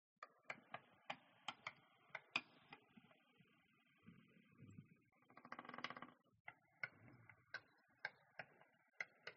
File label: spider crawl